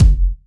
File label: round kick G key; single-hits-one-shots